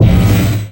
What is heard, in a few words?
energy lance fire, weapons; Stellaris